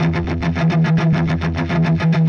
Chunk Guitar; Sonic Foundry (Sony Creative Software); Indie Rock Anthems; Session 02 - Clinical

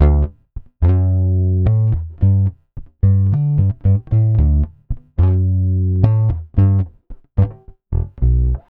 Pop, Bass

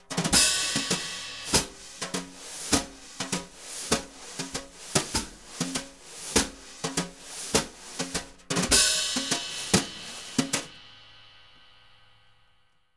BRU, BRUSH; Univers Sons; Jazzistic

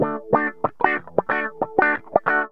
GTR, Funky; Zero-G; Funky Elements

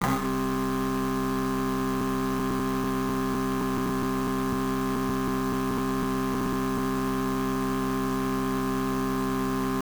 Bagpipe; Genesis

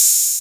HH OPEN; Big Beat Samples & More; AKAI

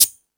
Percussion, Drum Machines E-MU Pro-Cussion

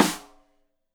Rim Shot Stereo; Deeply Sampled Drums; SMDrum

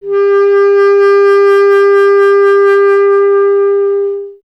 B. Clarinet

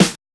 D&B Snare; neuro

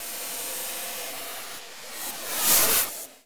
FX Scare, Drums & Drones

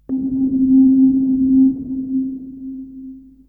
Synth hits and riffs

